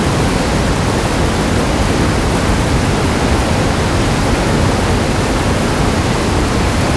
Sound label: Water Fall; Tazo fx